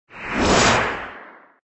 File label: Transform Sound